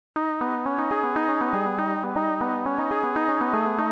electro synth echoer

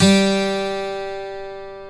12 STRING G, Twelve String Guitar

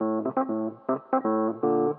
121 soul jazz rhodes; MELODIC LOOPS; KEYS ORGAN PIANO LOOPS

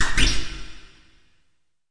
squish scratch; Weird and Interesting Hits; single hits

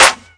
snare

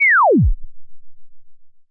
fall, 8 Bit Videogame; LO FI and 8 BIT KITS

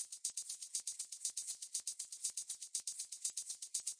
quiet persistent shakers, 120 bpm

